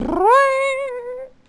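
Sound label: teleport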